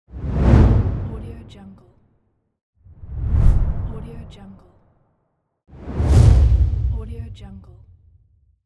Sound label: low swoosh